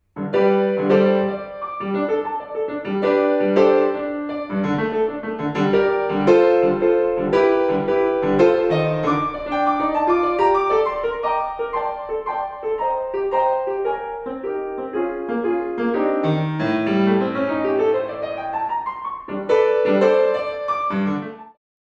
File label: Tango - Moderato; variationssuite